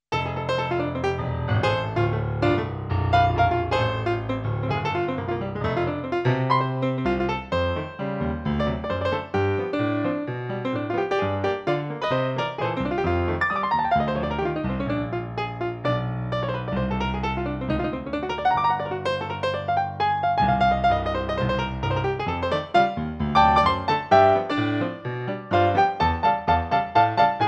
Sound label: CE200 Studio Grand